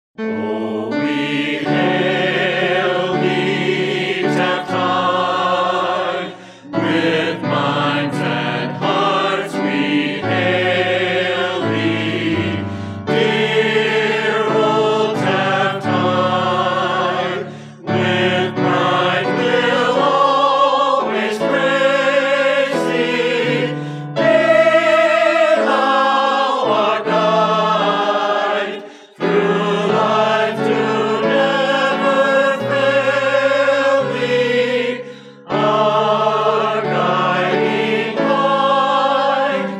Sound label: alma mater chorus